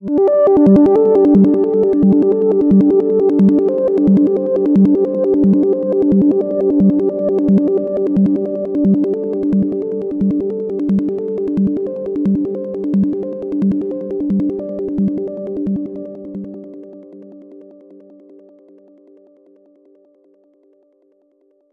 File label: Backwards and Up an Octave